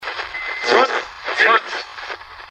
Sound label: ghost box